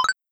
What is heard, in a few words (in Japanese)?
submit